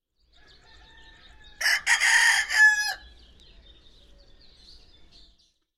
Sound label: rooster